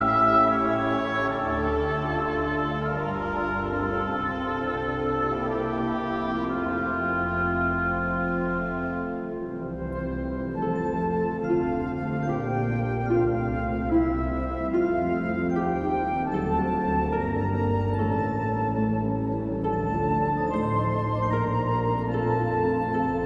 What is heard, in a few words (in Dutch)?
instrumentaal